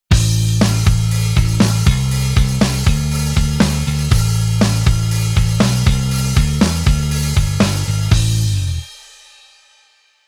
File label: Bass Arr Rock